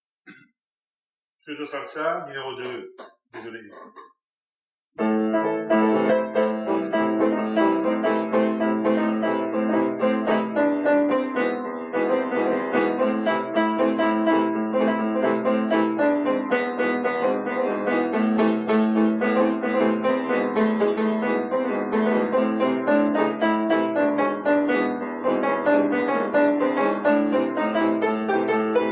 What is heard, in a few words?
Salsa y Cumbia